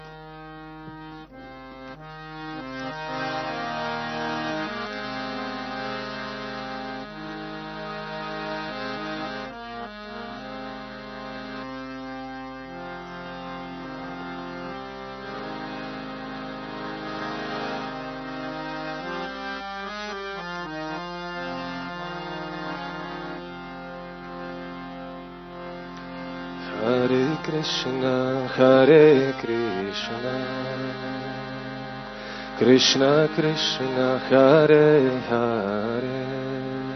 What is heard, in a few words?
Bhajans Kirtan Hare Krishna Kirtan; ISKCON Slovenia; Holy Name Festivals; Kirtan Waves